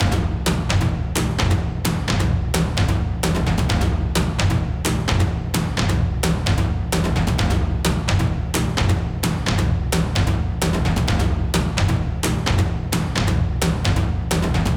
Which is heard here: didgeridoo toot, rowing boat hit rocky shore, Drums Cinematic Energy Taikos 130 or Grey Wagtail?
Drums Cinematic Energy Taikos 130